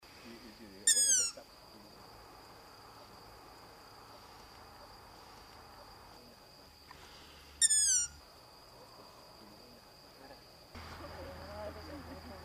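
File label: long-eared owl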